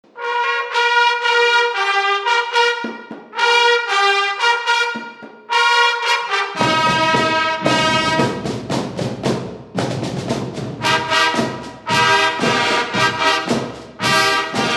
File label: Slow Fanfars